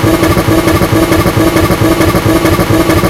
Rev limit, engine; AssaultCube; Alien Stars; American Muscle Series; SkyEngine

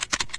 keyboard clicks; Garry's Mod; hl2

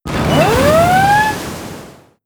naval alert; Hearts of Iron IV Death or Dishonor

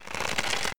reload crossbow; Mount&Blade Warband

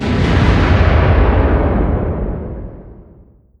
distance super heavy battleship attack; Hearts of Iron IV Death or Dishonor

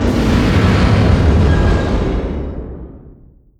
distance ships destroyer attacked; Hearts of Iron IV Death or Dishonor

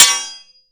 hit metal metal; Mount&Blade Warband

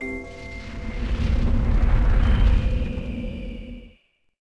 Blight; Sid Meier's Civilization 4 Complete; Beyond the Sword

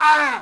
Archer Hit Vox; Sid Meier's Civilization 4 Complete; Warlords; Units

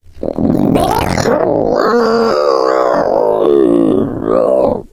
female boomer lurk; voice; idle; Left 4 Dead 2

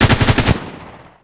mgun; sardukar; Emperor - Battle for Dune

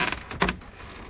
wood creak, materials; Garry's Mod; hl2

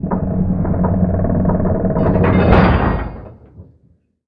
Simple Door Close; Ambient; Sid Meier's Civilization 4 Complete; Beyond the Sword

